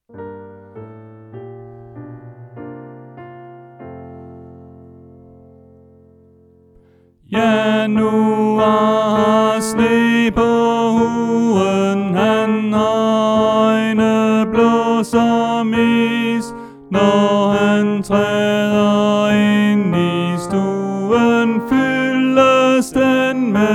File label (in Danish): Tenor